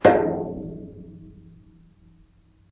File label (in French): Escalier en metal